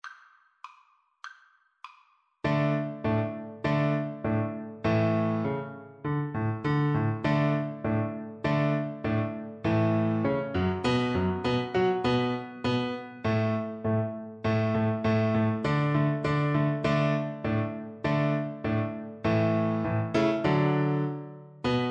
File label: Turkish March, Double Bass